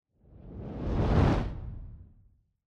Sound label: Camera Motion; シーン・カメラモーション